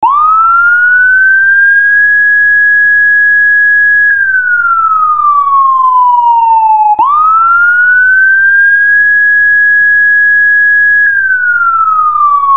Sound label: Med Siren; Sirens